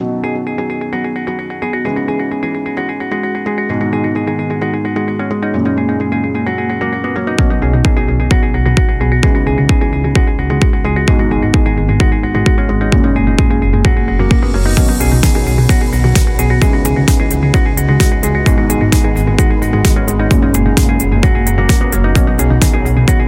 Corporate Opener

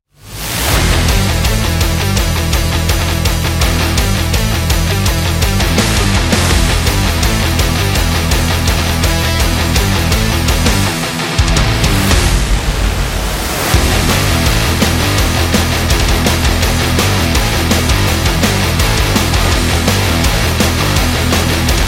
Metal Game Trailer; action metal and rock music

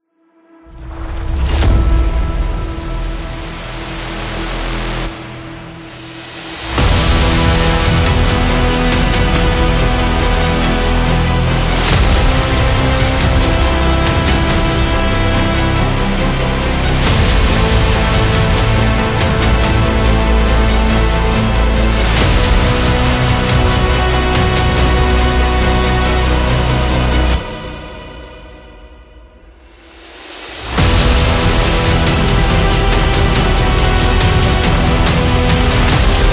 Epic Dramatic Trailer

